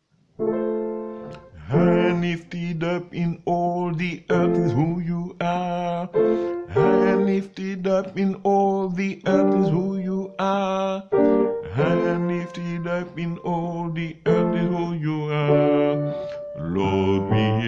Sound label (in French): Basse